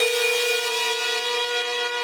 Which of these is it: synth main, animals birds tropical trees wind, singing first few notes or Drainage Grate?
synth main